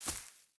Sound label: footstep hide grass run; Rust